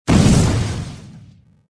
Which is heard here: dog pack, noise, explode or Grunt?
explode